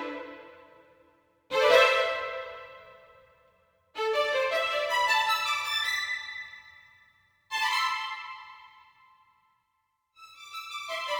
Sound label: Violins a; Сэмпл скрипки для фильмов; film music; Strings; Музыка для фильмов; скрипка; Movie Score; 80BPM